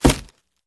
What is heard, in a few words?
Bullet Hits Wood Soft